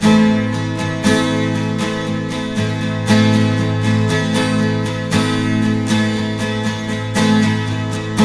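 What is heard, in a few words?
12 string minor A#, guitars; Spectrasonics; Hans Zimmer Guitars VOL-1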